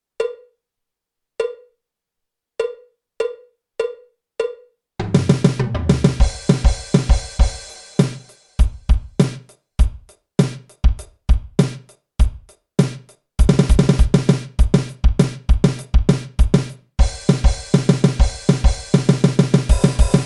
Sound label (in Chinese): 架子鼓独奏 摇滚融合; 66条架子鼓独奏SOLO